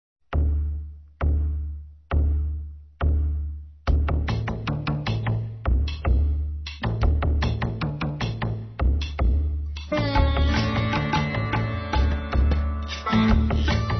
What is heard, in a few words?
Fusion Music Raga; RKC Live Stream; Travel India